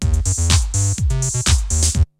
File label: LOOPS, TECHNO; Syntec; Wall of Sounds; TECHNO-BOX